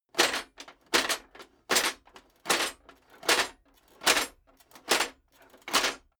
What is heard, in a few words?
metal plate armor movement sequence